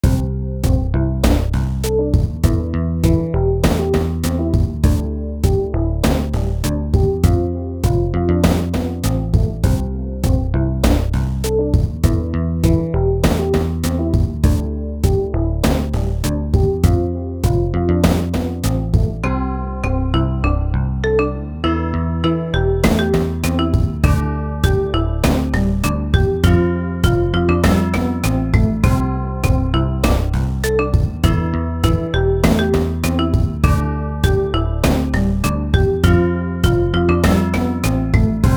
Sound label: Instrumental; friday night funkin vs. baby blue brother